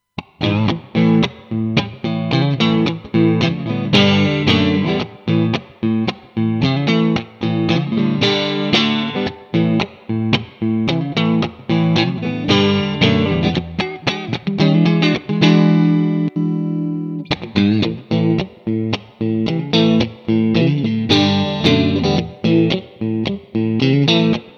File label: GTRS P800 Clean